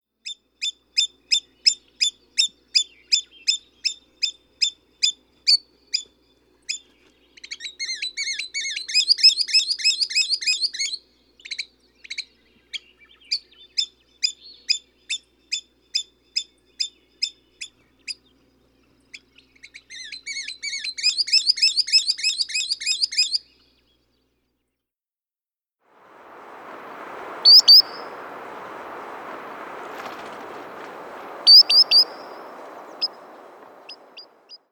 Solitary Sandpiper

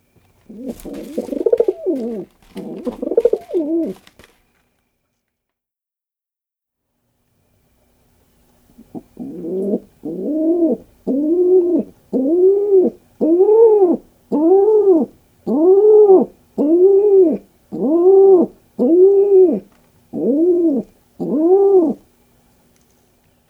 Rock Pigeon

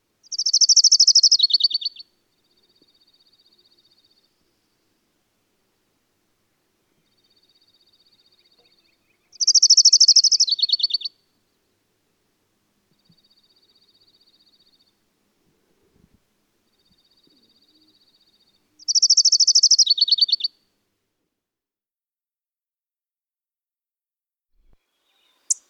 Orange-crowned Warbler

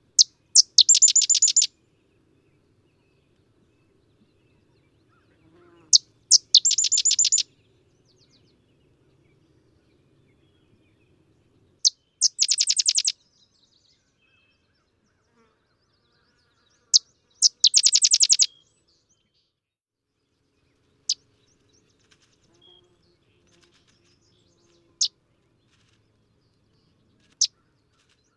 Sedge Wren

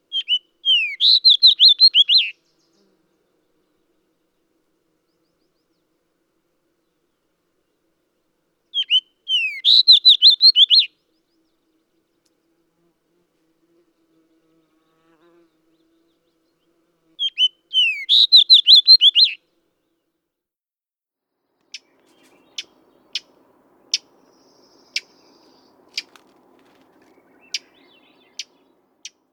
Fox Sparrow (Red)

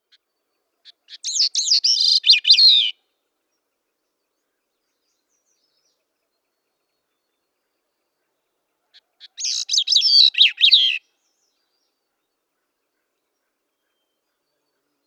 Gray-cheeked Thrush

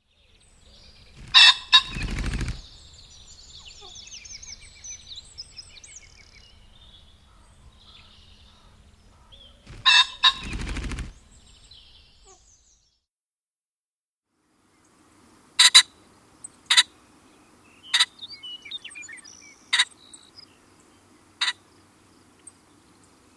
Ring-necked Pheasant

